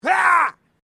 MALE SCREAM MID; Male Vocal sounds; Screaming (Sound Effects:Voice)

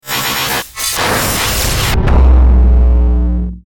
Stinger Swell Digital Hits; Production Element Imaging Element Accent Transition

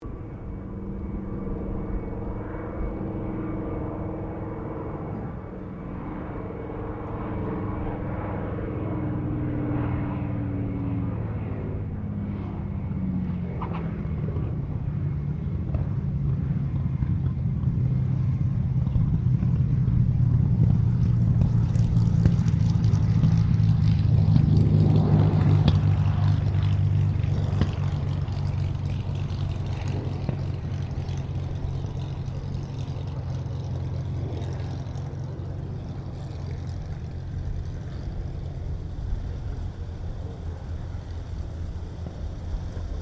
1944 SUPERMARINE SPITFIRE MK-VIII LAND; Airplanes; Vehicles

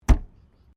Car Mazda Rx7 1983 Series3 Exterior Boot Close; Cars; Vehicles

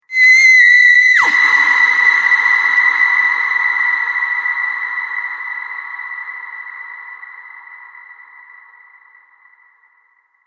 Scream Cathedral Drone; Drone Sound Effects

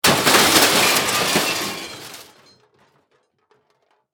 Impact Crash Metal; Materials

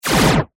Hit Laser Sci Fi; Sound Design; BlastWave

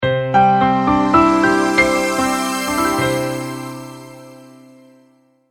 multimedia logo, Musical Identity/Signature

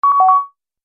Multimedia Event Notification, beep sound effect

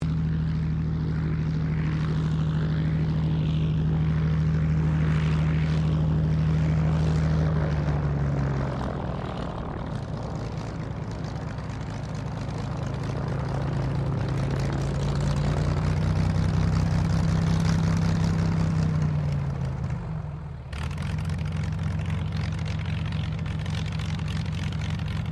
AIRCRAFT CT4A TAXI, RAAF CT4 training aircraft; Airplanes; Vehicles

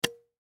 Jar Grab Handle Movement Hand Pat Glass; Food; Foley

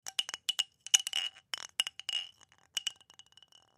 Wind Chimes Wood, Foley Wind Chimes Wood Hit; Multimedia; Blastwave

